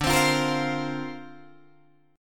D-Minor 7th Sharp 5th D Guitar DADGAD, chord

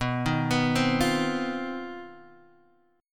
C Minor Major 7th Double Flat 5th B

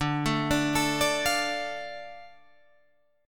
D Minor D Guitar Open G

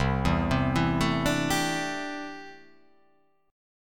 C 6th Add 9th C down

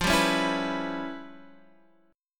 E Minor 6th F; Em6/F Chord {X 8 5 6 5 7} strummed

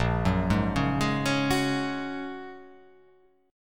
A sharp Minor 7th Sharp 5th A sharp

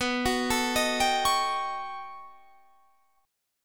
B 7th Flat 13th B, guitar chord

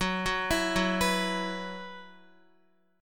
Gb 7th Suspended 4th Gb down Guitar, chord

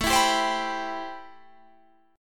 A-Minor 9th A

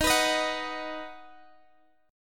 D sharp Minor Sharp 5th D sharp, Guitar Standard; strummed; x,x,13,16,16,14; capo=2

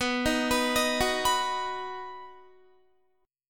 B Major, Guitar, 4E,4A,5D,5G,5C,6F; arpeggiated; 19,18,21,20,18,18

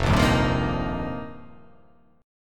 F 9th Suspended 4th F, chord; strummed; JGuitar

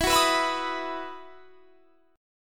E Major 7th Suspended 2nd; EM7sus2 strummed

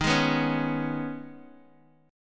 Eb 7th Suspended 4th Sharp 5th, Bass Standard; Eb7sus4#5; strummed; 23,23,21,18; JGuitar

